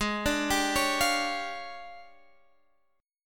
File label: D Minor Major 7th Double Flat 5th, G sharp